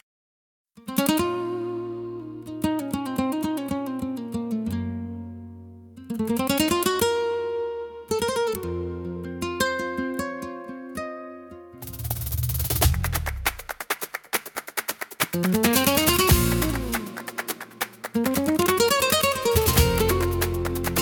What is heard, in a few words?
flamenco